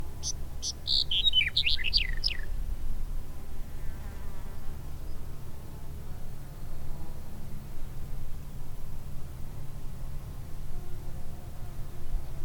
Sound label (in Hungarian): kucsmas sarmany hangja